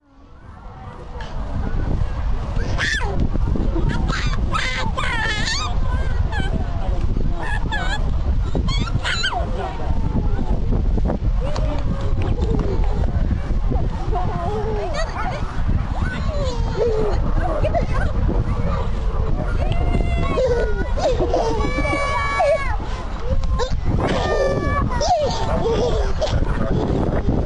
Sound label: trampoline